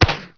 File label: Rifle Tranq